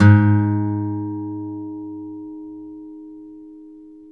STEEL P G#1, Synclavier, GUITARS; Synclavier Sampler Library

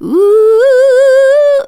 vox, Techno Trance; Ueberschall

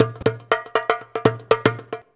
119 DJEMBE, Modern Percussion Loops

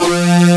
AN SYN, SYN & LEAD; SAMPLE WAVE MIXING 2

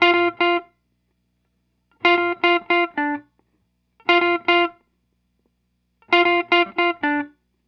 Guitar, 70s Analog Funk; Sonic Foundry (Sony Creative Software); What it Is! 70s Analog Funk

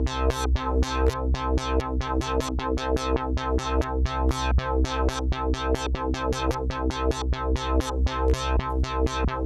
FILTER C3, SYNTH, Trance; AMG; Global Trance Mission VOL-2

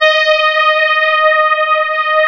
CMI ORGAN; Wizoo - Powered DX

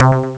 mono echo; Amiga Soundtracker Sample Packs

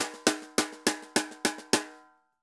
Tambor Poly; Best Service; Festa Latina VOL-1; Drums & Percussion

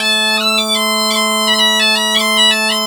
AM BP, Celtic Flavours, BAGPIP085; USB Soundscan vol.42; AKAI

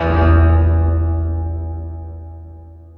Synth guit bass; Sonic Foundry (Sony Creative Software); Ugly Remnants VOL-2; Basstuff

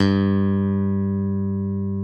G1 LONG, STIK BASS; D-STIK BASS2

